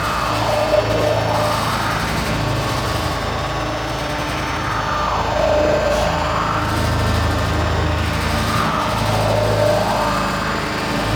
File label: Defense Door Loop A; Warframe; Corpus Infested; Infested Colony Defense Objective